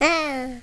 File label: 8-bit Baby Blurb